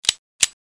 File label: cock load, guns; KABOOM! - Wild and Wacky Sound Software; beeps